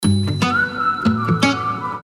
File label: whistling message; soobshcheniia sms